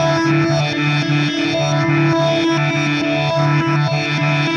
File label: Reverse Guitar; Sonic Foundry (Sony Creative Software); Indie Rock Anthems; Session 02 - Clinical